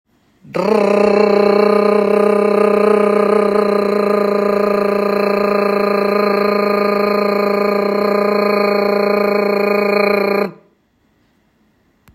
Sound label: trill